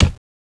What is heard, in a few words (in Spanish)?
golpe